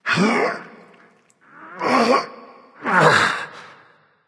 nv grunt lift; cernunnos

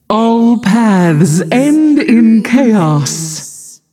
"yay"; smite; voicepack; nuwa

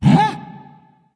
surprise; smite; voicepack; kuzenbo

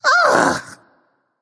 nv ugh; smite; voicepack; ganesha

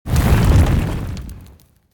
dirt rumble; smite; khepri

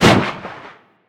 fire; smite; scylla

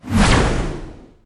basic fire; smite; chronos

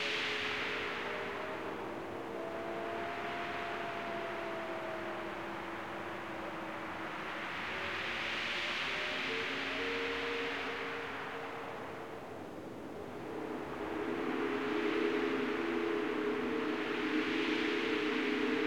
ambience bad wind; smite

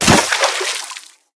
death disappear; smite; kuzenbo

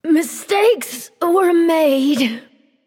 death; smite; kali

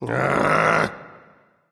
ugh; smite; voicepack; vulcan

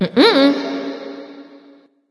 disagree light; smite; voicepack; hel

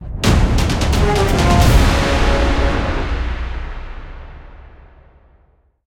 mus death; Smite; thor gladiator

